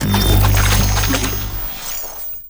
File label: UI Menu On; Lich; Infested Nemesis